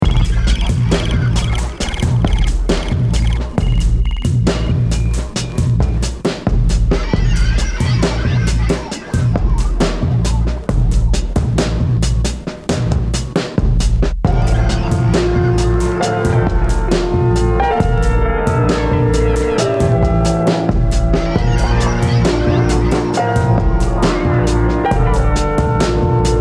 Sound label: piano